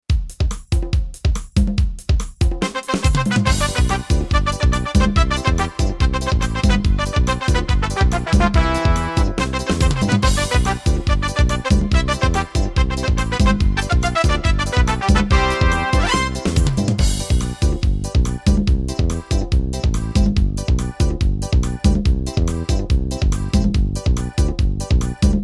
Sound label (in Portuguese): ritmos yamaha rasqueado cuiabano